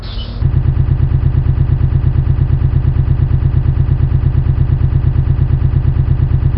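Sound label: Compressor Start, Train; OpenBVE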